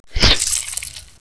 strike; tunga

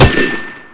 impact, flaregun; weapons

